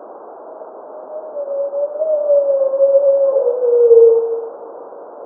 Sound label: humpback call; ocnms